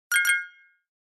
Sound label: alarm double